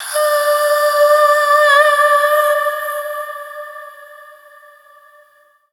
Dance Vocals, FEMALE; Best Service ProSamples vol.12; AKAI

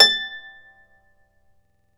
Celtic Harp, Plucked Strings

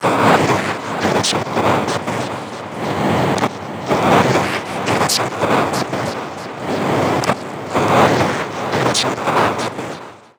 grit; transmissions

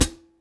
HH PD, Drum 1 Dry; East Collexion

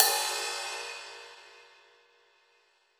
Brush Crash; Roland BK-5

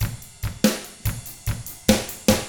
rock 6/8, drums; ILIO; Double Platinum Drums 1

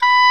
Oboe, Orchestral Winds; Roland LCDP04 Orchestral Winds; WND Oboe f

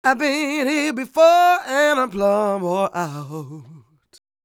SING W, Vocal Planet Blues & Soul, BLUESY; ILIO

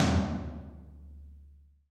TOM TOM, Roland

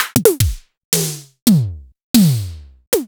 Akai MPC500 Simmons

